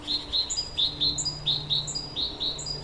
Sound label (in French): Charbonniere chant